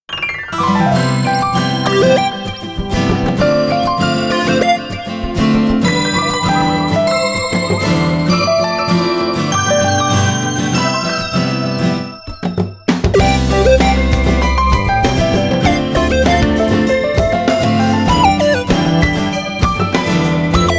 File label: ringtong; Gionee V185